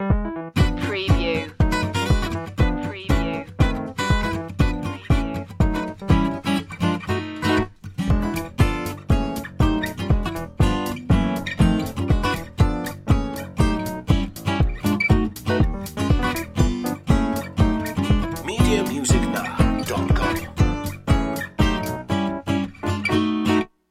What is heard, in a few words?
gypsy swing loop